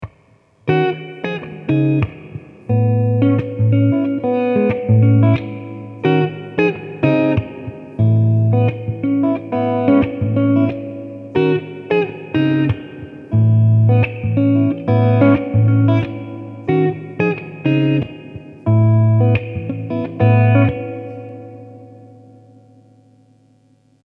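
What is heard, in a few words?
Guitar Spring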